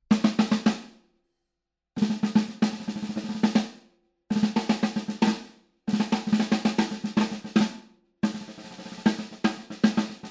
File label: SNARE 14˝x 5 5˝; Fame Fortress